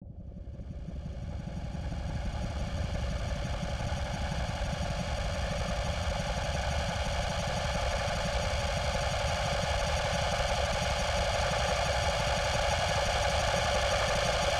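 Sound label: space sound black hole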